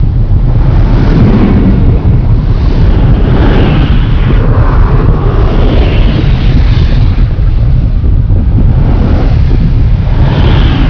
rail lava; tfc

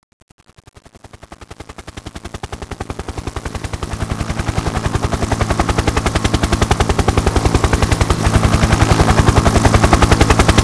medevac, ambience; firearms

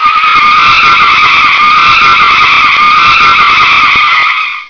brakes

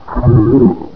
alert

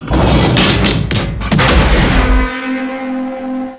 moo

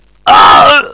die; svencoop; paranoia; diversant

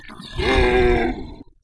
zombie voice idle

